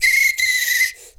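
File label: foudang groove; Best Service; World Colours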